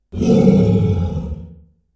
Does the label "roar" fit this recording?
Yes